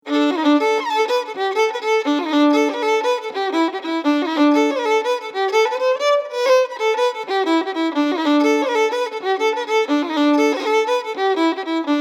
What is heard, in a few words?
Jig; Bill Harts